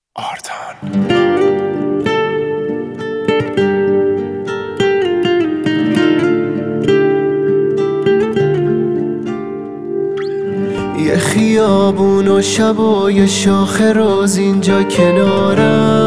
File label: music happy birthday